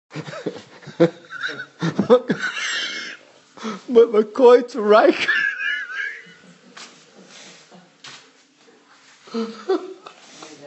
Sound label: hahahahaha